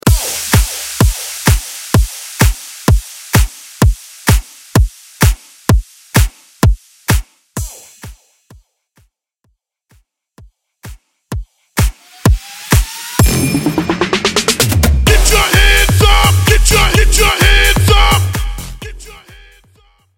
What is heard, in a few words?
EDM Bootleg 128 Bpm; Mainstream VIP PartyUp Vegas Edit